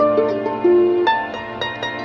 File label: guitar plucked, Loops; Phonky